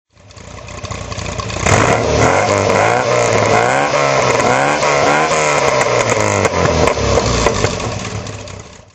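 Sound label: mgb throttle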